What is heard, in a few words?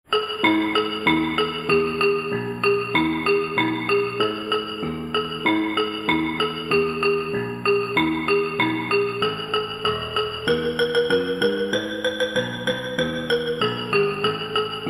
Grasshopper RAP